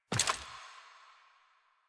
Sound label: Steps; Arthur; Warframe; Ambience; Nineteen Ninety Nine